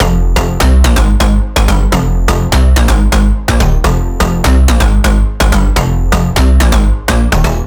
Big EDM Bass Loop F1; Future House; Future House Tools; Bass Loops

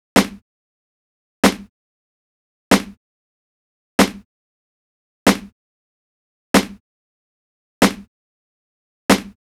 Snare; Hip Hop Exotica; big fish audio; Apple Loops; Drum Tracks

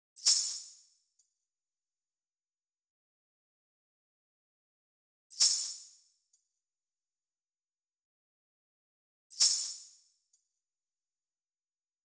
ghungroo 105, Hip Hop India; Hip Hop India Modern Percussion; Apple Loops

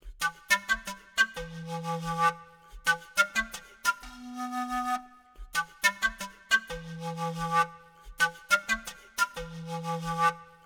Flute A, Hip Hop Exotica, Apple Loops; Big Fish Audio

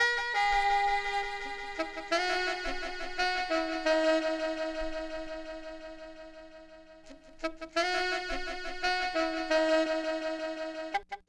Sax, 085 Cmin

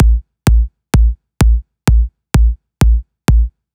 Big EDM Kick Loop, 128 BPM; Future House Tools

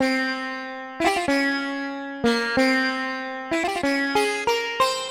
Sitar, 094 Cmin

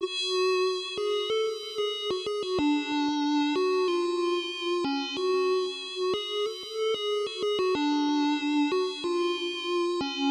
Synth A; Hip Hop Exotica; Apple Loops; Big Fish Audio

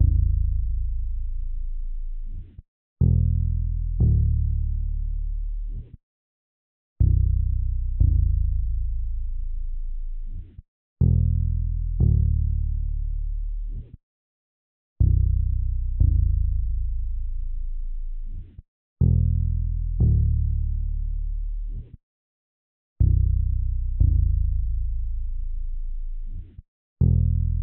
120 BPM A Min Bass; Cymatics; Destiny Gold Expansion; Blood Ties

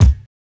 Kick Hit; 100 Ebmin; Hip Hop Exotica; Big Fish Audio

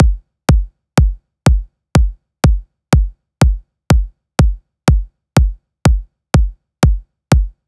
Big EDM Drum Loop Kick loop, 123 BPM; Future House; Future House Tools; Big EDM Drum Loops

